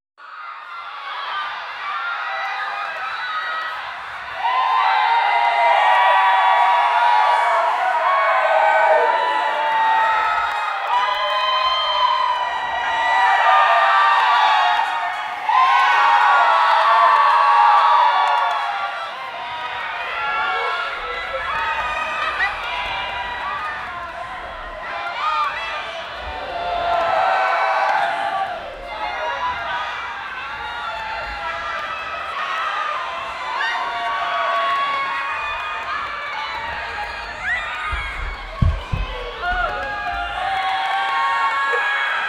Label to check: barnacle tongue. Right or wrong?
Wrong